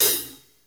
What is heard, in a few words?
hat open, Live Kit; Sonic Foundry (Sony Creative Software); Ugly Remnants VOL-2; Single Shots; Beatstuff